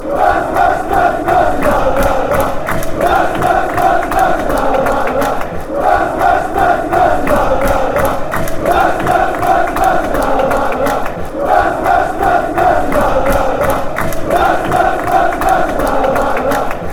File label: bouncy bouncy, chants